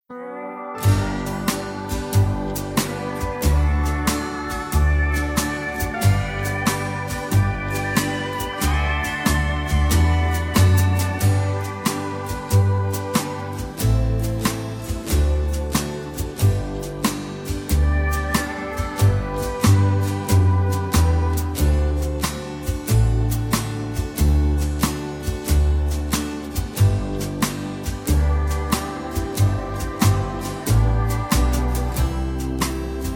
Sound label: (G) No Backing Vocals